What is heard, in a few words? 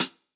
Acousti Hat Hit, hihat; ultimate hihat; Hits